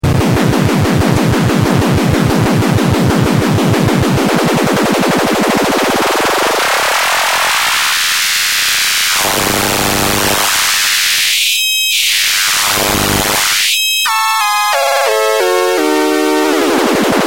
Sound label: wild, modular